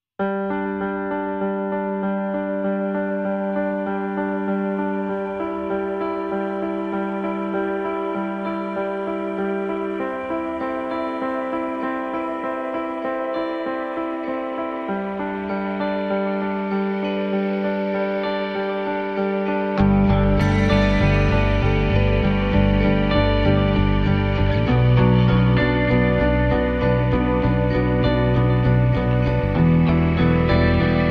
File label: No Glockenspiel